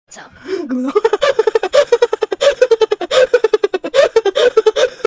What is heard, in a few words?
ahahahhahahahah